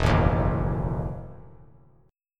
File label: E Major 7th Suspended 2nd Suspended 4th E; strummed; 3C,3F,3A,3D; JGuitar